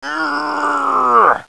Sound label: Grunt; Princess and the Pea; SoftKey